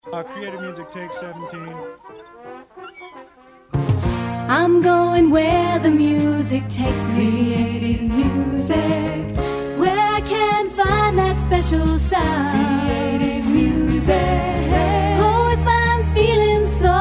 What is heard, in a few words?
creative music Jingles